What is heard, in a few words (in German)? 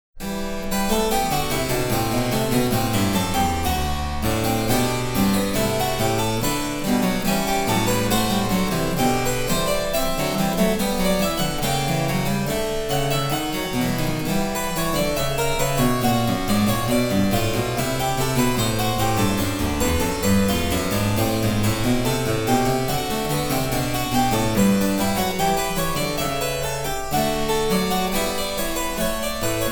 Cembalo; Mark Lindley WTC 1